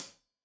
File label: Hat Tight, Hi-Hat, Cymbals; Deeply Sampled Drums